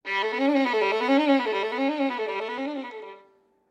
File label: Violin Whole Note Runs